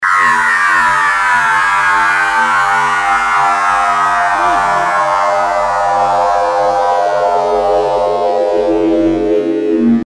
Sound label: Spaceship Sci Fi Weapon